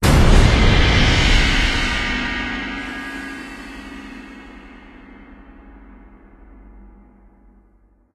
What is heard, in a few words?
Stinger Arm Grab; silent hill homecoming 2008 gamerip pc ps3 x360; Ambient